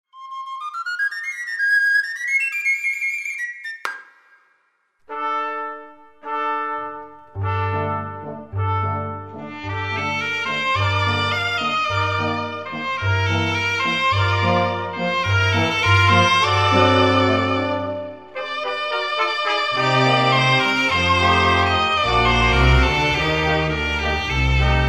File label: Cobla, enregissardanes